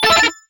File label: Gauge Full Notify; Rockstar